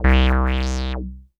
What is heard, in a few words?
SYNTH